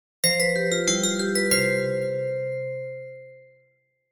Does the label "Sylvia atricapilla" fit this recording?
No